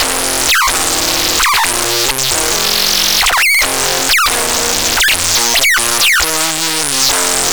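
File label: guitar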